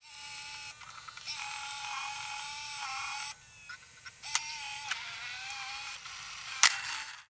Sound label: camera idle